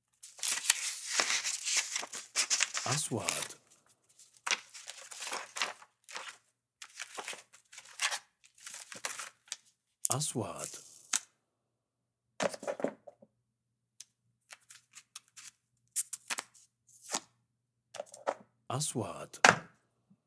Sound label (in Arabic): دفتر ملاحظات صغير